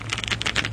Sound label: pull; bow